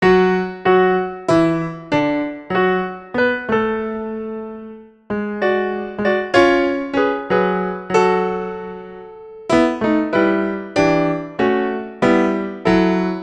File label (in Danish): 3 stemmigt